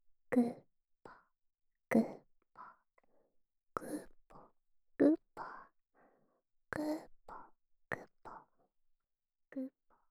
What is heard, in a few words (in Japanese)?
ぐぽぐぽ